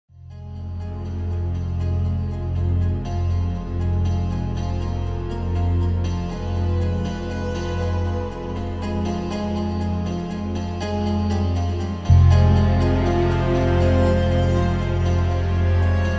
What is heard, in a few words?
Oppression Ambience; Red Faction Guerrilla